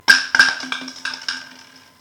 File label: Bottle